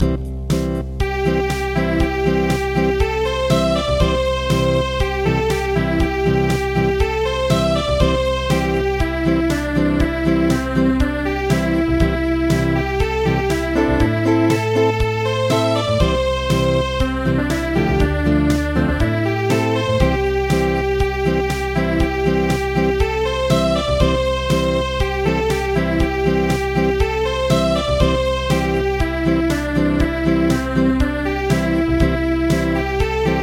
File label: Acoustic; Candle Dance